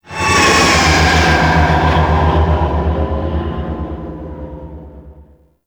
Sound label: Fly By Quick; Vehicles; Warframe; Liset; Liset Flight; Nora Liset